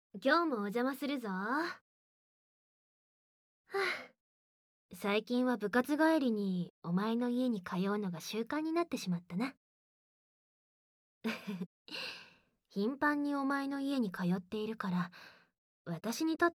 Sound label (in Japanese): 耳舐め SEなし, ASMR; おチンポおねだり